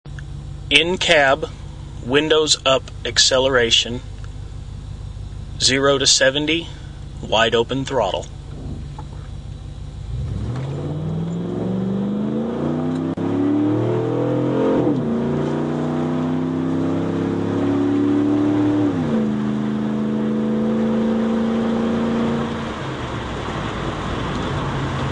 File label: 0-70 W-U WOT, aero-turbine muffler